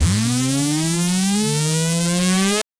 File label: a short energy fuze elect